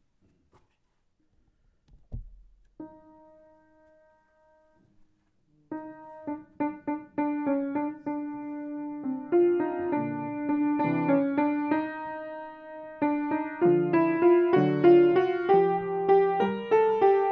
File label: piano